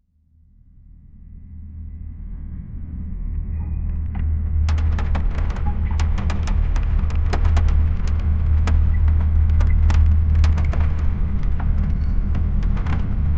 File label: a soundscape depicting a, oars